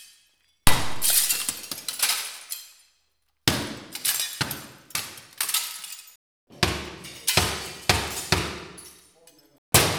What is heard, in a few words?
glass breaking